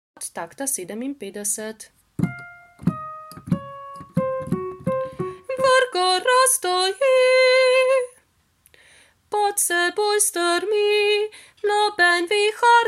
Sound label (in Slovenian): Viharnik 2. glas